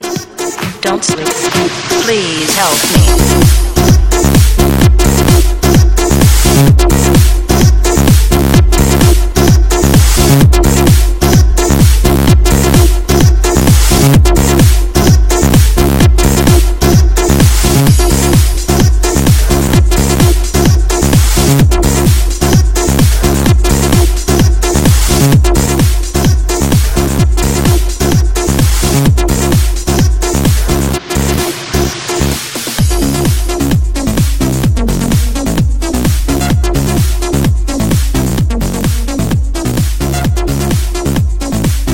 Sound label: Electro Bomb